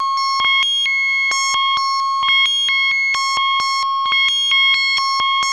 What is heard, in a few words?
RHYTHM FM